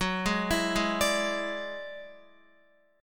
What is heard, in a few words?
Gb 7th Suspended 2nd Sharp 5th, Guitar; arpeggiated; JGuitar